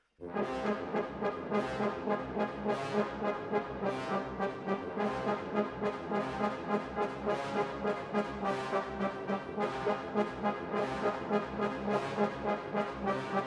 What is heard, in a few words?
Horns Over